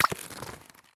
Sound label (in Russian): Тап для раздела на общей карте